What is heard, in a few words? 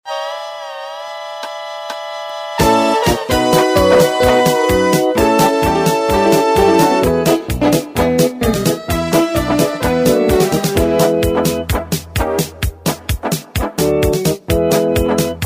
music